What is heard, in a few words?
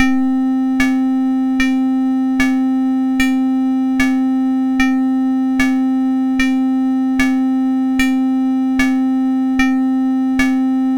Dripper, MOS6581R4; hvsc